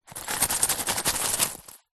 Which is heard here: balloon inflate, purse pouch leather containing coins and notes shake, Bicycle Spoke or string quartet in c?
purse pouch leather containing coins and notes shake